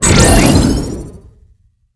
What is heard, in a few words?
speedboost on; cstrike; Valorant